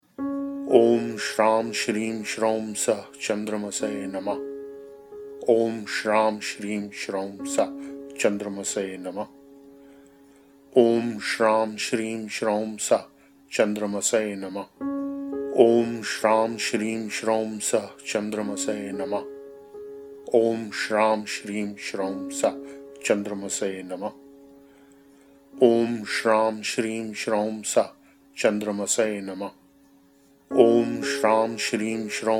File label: Chandrama beej mantra